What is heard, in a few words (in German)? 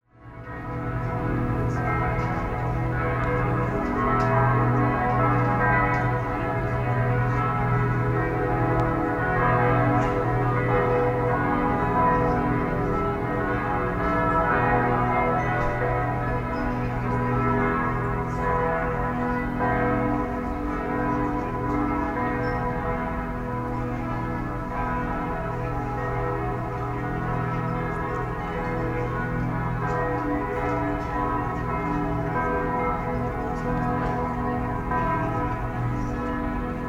graz schlossberg mariahilfer glocken